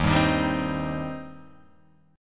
Eb 6th Eb down Guitar Open G

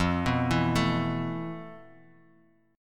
F Major 7th Flat 5th Guitar Half Step Down; FM7b5

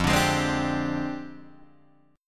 F Minor 6th Add 9th Guitar DADGAD; 3,5,6,5,5,5; down; chord shape

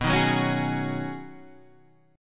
B 6th Add 9th B Guitar Standard, chord; strummed

